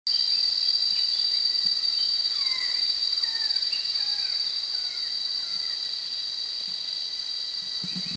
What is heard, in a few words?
Raffles's Malkoha